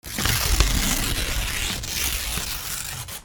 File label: RIPPING